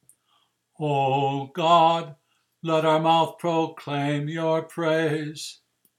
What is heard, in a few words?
preces